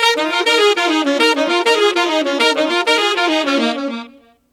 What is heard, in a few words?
Sax Section Effect, effected sax section; Sonic Foundry (Sony Creative Software); Jazz Solos and Sections